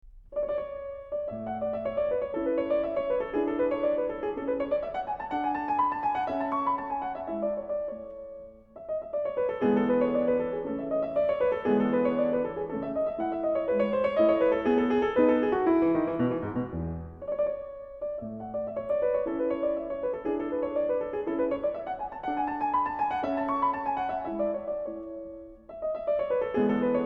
Violin Sonata in A, Variazione I; Mozart; K305 293d

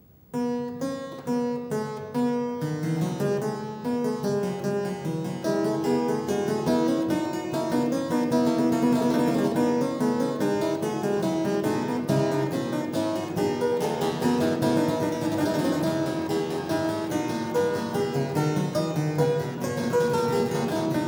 Fugue F Major; Bach - WTC - Tilney

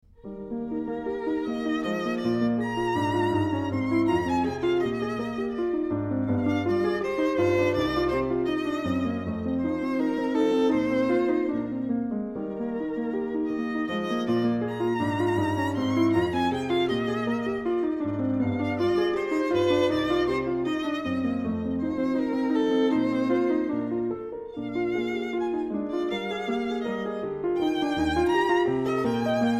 Violin Sonata in E flat, Variazione I; Mozart; K481

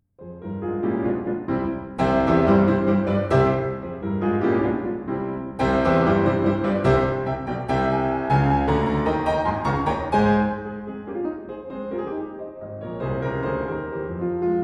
Piano Sonata, Allegro Molto; Beethoven; No. 31; Op. 110

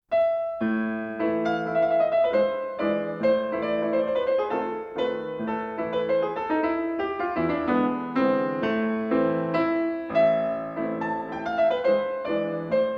Mazurka No.45 in A minor, piano

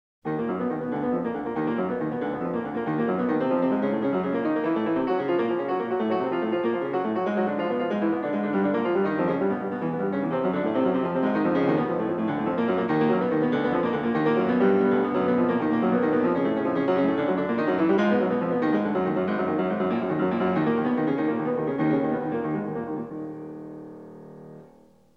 Prelude in E flat minor, Piano

